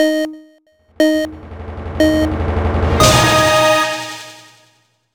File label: Minigame Countdown; Shrek Ogres & Dronkeys; NDS